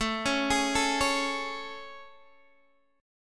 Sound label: A Major 7th A, Guitar, Open D